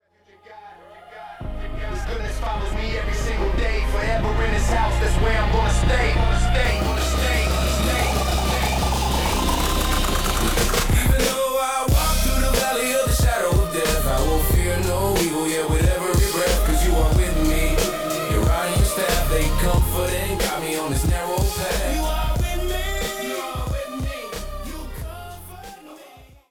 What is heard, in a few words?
RAP; Psalm 23 The Lord is my Shepherd